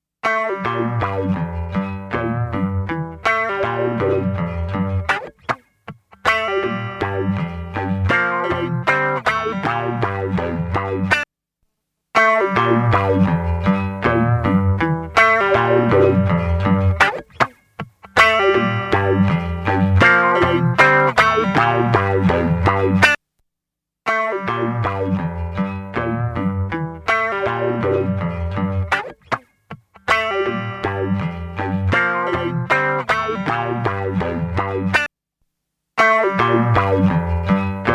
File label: Funk Guitar Loop